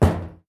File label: falling on metal roof; mixkit